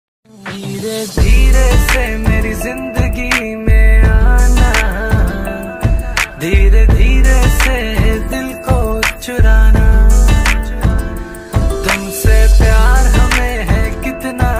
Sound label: new hindi ringtone